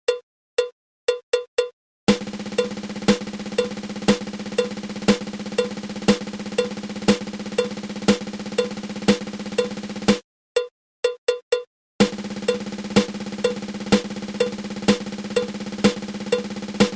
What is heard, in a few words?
Fifteen Stroke Roll